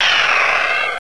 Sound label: Quick Beam In